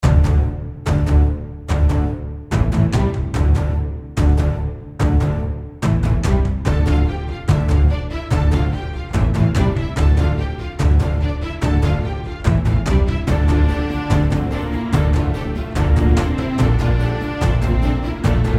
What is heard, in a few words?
Music Game